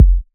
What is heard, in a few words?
Kick, Dubstep 808 Kicks; GhostHack